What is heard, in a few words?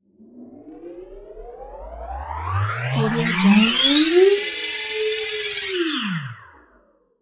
synthetic engine riser; Sinematic 2; soundmorph